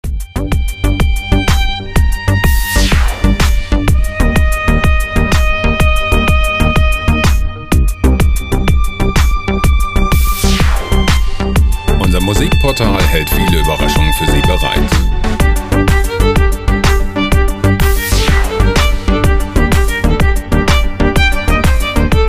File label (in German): dieser Jumpstyle Loop verknüpft auf bezaubernde Art klassische mit moderne Musik; 121 bpm; dance; techno